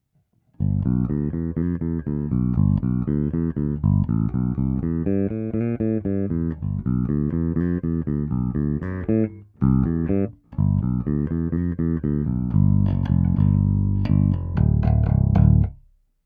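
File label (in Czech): H-H prsty, nerezová ocel, Roundwoundy, Schecter SLS Elite-5 baskytara; D'Addario EPS170-5SL ProSteels 45 - 130, Super Long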